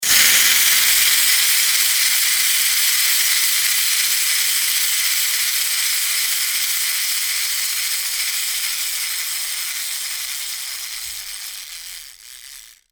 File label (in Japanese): ゼンマイ おもちゃ 動かす